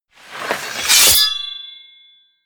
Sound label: scrape